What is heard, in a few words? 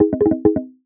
Notification Error; Sound Ex Machina